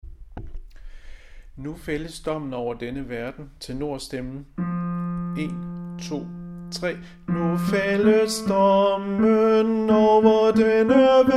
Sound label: tenor; Nu fældes dommen